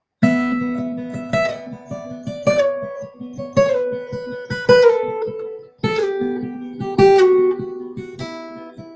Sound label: Guitar; MusicLM